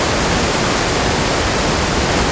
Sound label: waterfall; Star Wars Knights Of The Old Republic II; XBOX